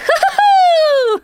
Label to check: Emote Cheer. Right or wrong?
Right